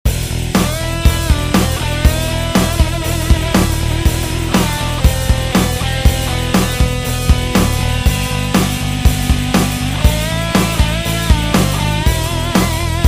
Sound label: Drums